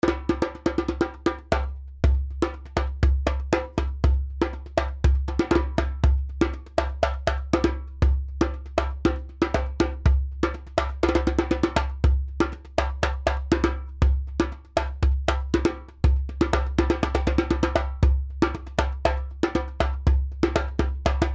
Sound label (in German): akustische Djembe; Lawo original